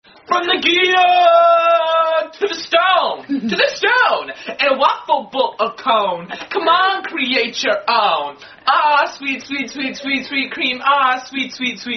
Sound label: Singing Tip Song; Cold Stone Tip Song; Unknown Coldstone Creamery Tip Song